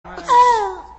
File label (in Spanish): eructa en vivo